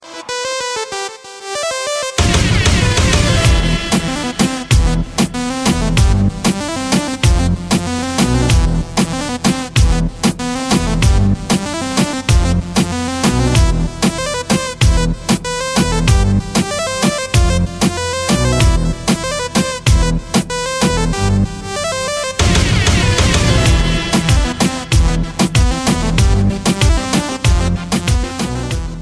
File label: latin beat ringtone